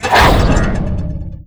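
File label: spell conjuration hit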